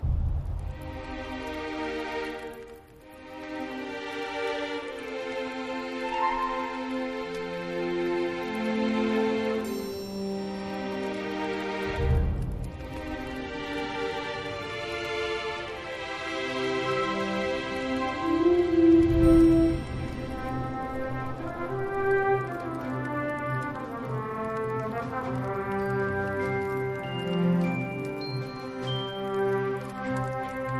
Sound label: ambience general; lost relics